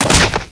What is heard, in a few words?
fall hit; eqclassic